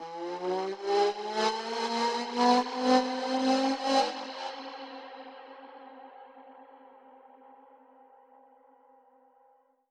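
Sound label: Reverb Proc, alarm siren samples, 120bpm; musicradar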